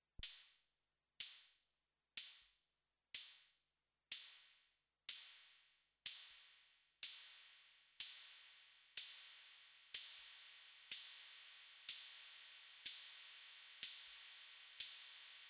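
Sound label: MFB Tanzbaer cymbal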